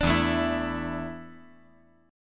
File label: E 7th Suspended 4th E down 5G 4C 5E 5A